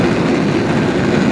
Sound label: target, magic; The Elder Scrolls III - Morrowind; Morrowind GOTY GOG